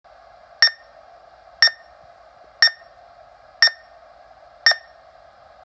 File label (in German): Wanderfalke Beepton; DARC